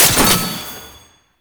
Rocket Launcher Mech; Warframe; Tenno; Lasrian

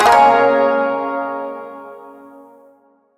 Shawzin Phrygian Chord L; Chords; Warframe

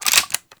Infested Swarm Rifle Reload; Shock Swarm Rifle; Weapons

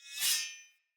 Sphere Dual Sword Accent Blade; Weapons; Player; Swords

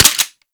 Fire 2D Mech, Weapons; Warframe; Boar

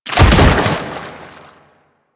Lasrian Bodyfall Light Water; Lasrian Soldiers; Foley

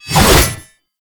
Bayonet Melee Lunge; Warframe; Tenno

